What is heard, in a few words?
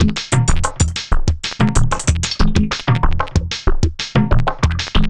Drum Loop, Drum Loops; Sonic Foundry (Sony Creative Software); Leo Cavallo Bass Taster